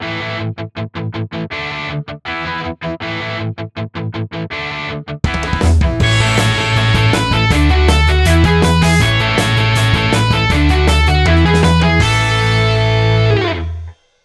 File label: powerchord song major